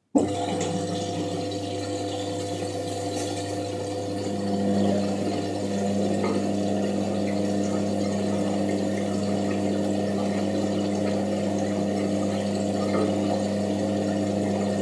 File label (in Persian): صدای وارد شدن آب به لباسشویی; علت صدای زیاد ماشین لباسشویی